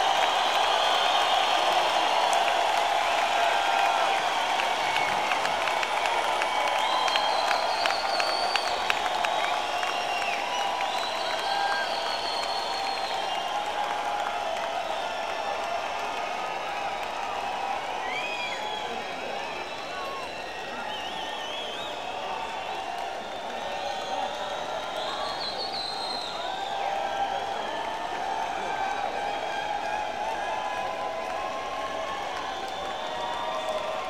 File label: crowd; West Palm Beach, FL; Coral Sky Ampitheatre